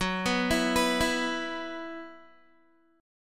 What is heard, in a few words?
Gb 7th Suspended 4th Gb down Guitar, chord